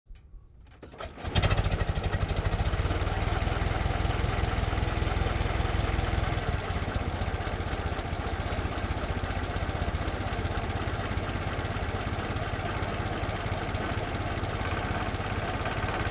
1924 Ford Stake Bed Truck Ext Start Idle Fast Away; Period Vehicles